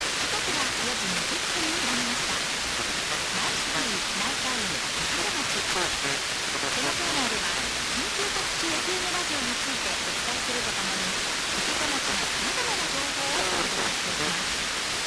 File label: 82.8MHz; AFM